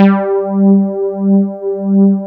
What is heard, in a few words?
RUST PAD G3, MKS 70; Northstar - New Gold VOL-1